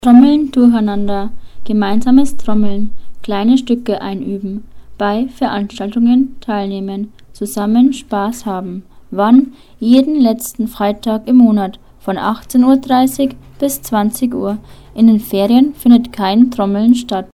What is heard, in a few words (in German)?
Trommeln richtig